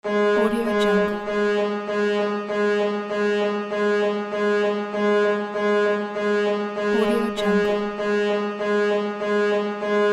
alarm; akensound

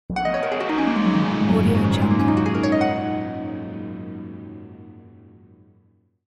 chinese harp logo

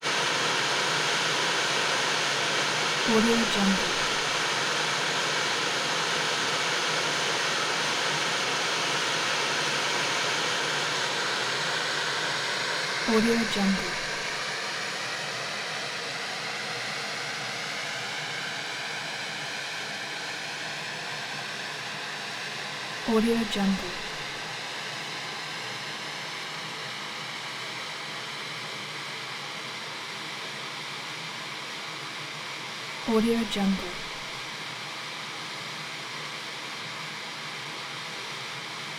television static white noise phased tubular

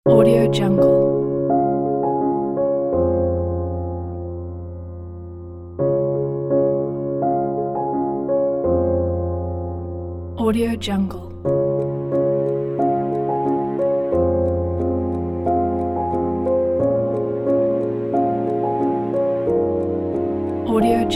inspirational piano

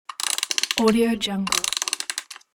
music box wind up

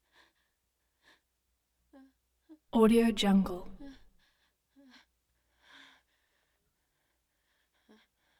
cries whimpers; prosoundfx

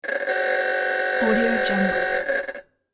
vintage car honks horn

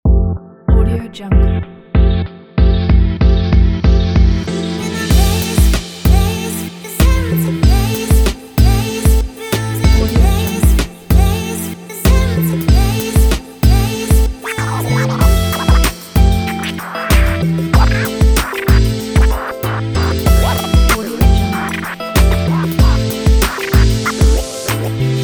hiphop

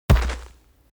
creature coloss step

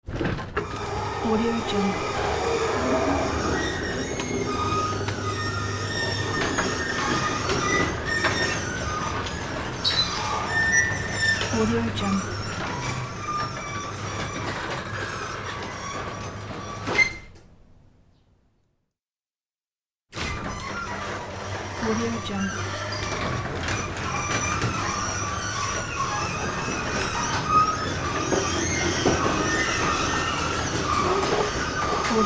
old squeaky garage door

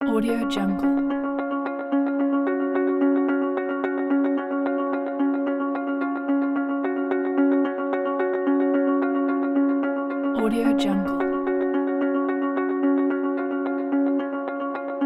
acoustic corporate

